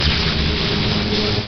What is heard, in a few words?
ELECTRIC; Mr. Bean